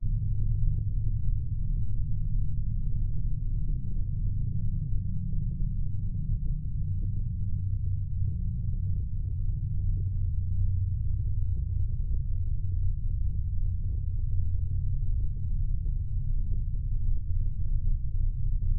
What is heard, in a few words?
charged up power down very low sub energy movement future; Alexander Gastrell